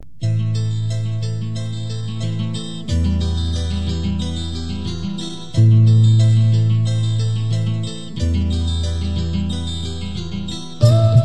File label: samba